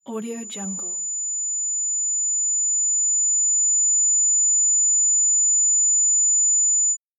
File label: ultrasound; yellowcatt